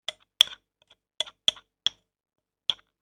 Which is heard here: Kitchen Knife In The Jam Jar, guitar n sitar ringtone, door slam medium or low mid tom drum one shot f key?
Kitchen Knife In The Jam Jar